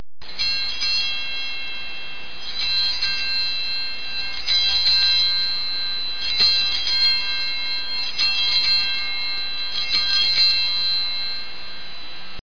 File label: bell ice